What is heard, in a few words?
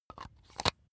razor battery compartment close